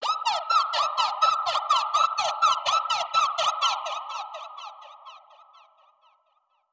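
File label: Modulation Processed, alarm siren samples 125bpm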